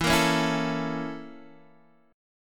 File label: A sharp Minor Major 7th Flat 5th